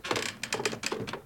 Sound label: Wood